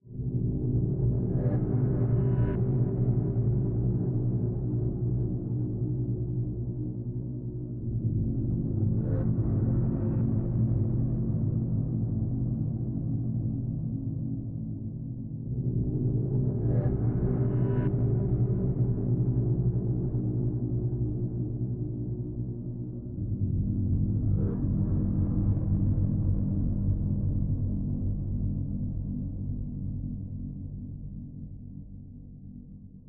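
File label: Audio Drone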